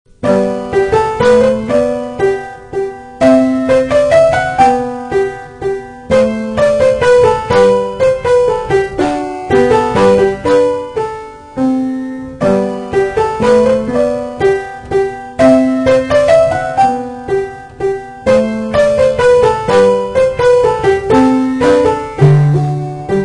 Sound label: Bach Menuet